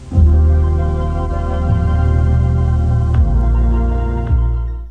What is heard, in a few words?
Tone